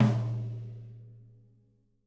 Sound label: SNRONMP L, Living Drums